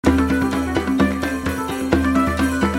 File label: Re Spin Short Uptempo Loop; fire Wizard; Axial